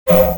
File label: Click layer